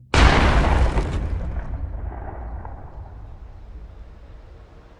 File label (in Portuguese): tiro